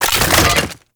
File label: Assassinate Key Pickup; Warframe; Nineteeen Ninety Nine